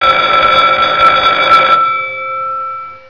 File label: fire alarm